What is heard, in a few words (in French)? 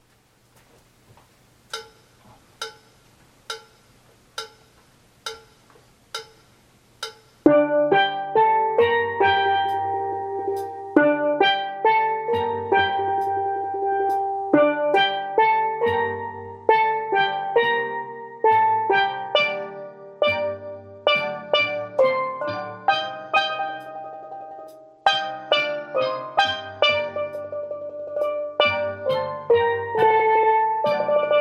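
Intro Ténor, Steel Band; Bella Ciao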